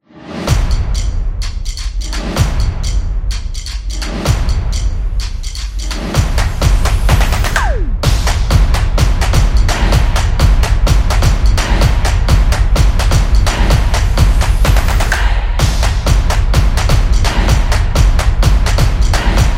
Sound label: Stomp Beat; drumming action